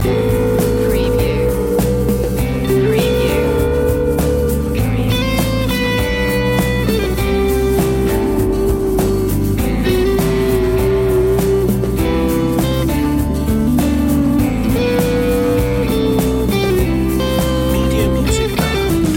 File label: spaces loop